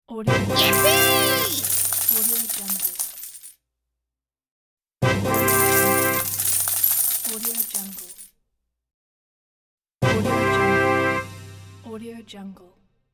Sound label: jackpot winner; applehillstudios